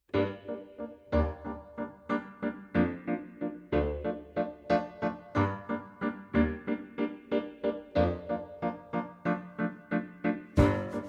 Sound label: Viola